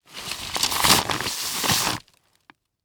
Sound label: Metal Stake Sliding Into Paper Bag; Sports Stake